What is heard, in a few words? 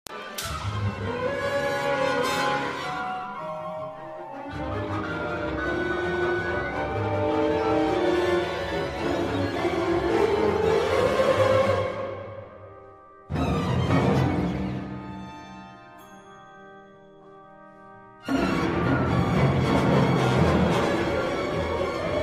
wind orchestra